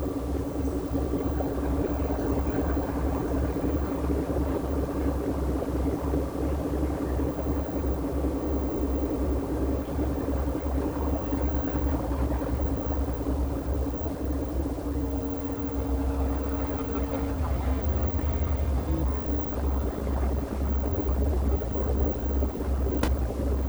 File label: motor